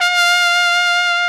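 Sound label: SOLI Trumpet, Alpine Volksmusik, TROMPETEN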